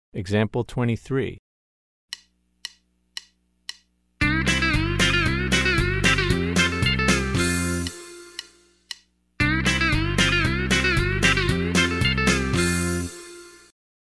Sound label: Country Rock